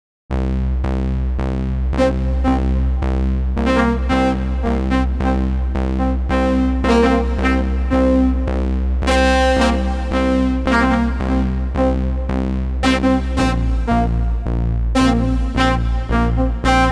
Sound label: slow saw groover; the digital life